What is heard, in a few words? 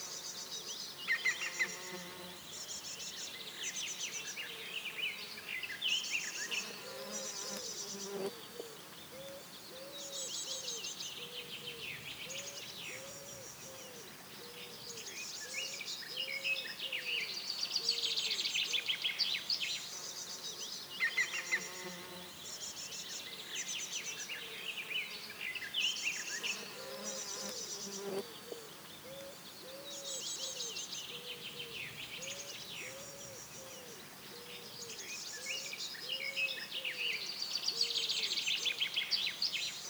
atmo outdoor loop; Playmobil Circus; Wii